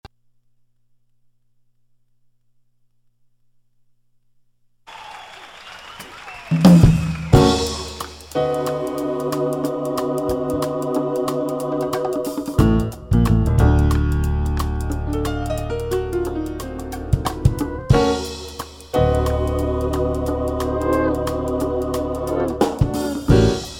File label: Chicago Blues